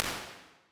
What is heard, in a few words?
Recording Room, impulse responses, small spaces, rooms; Apple